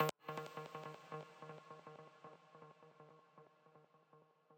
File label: arp